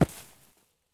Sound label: landing grass low; Escape from Tarkov